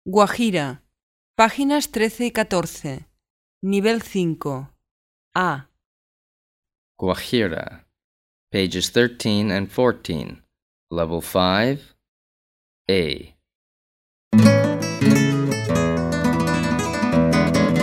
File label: guajira, guitar; nivel 5